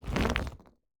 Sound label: Stone Move; Fantasy Sounds Bundle; Craft